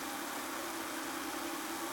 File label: reel spin; narcos